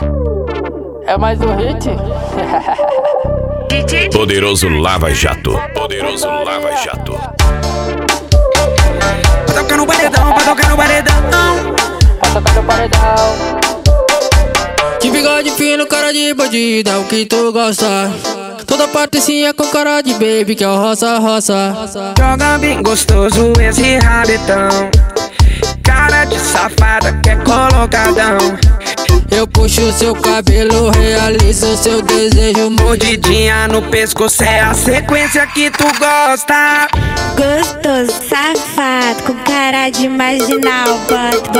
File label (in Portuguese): funk sertanejo